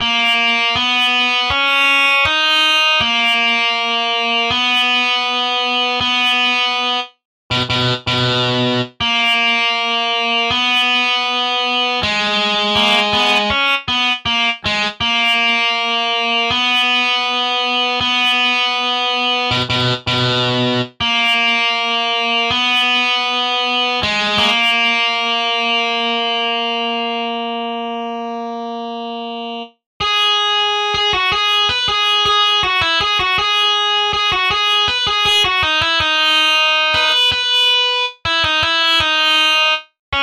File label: Guitar Solo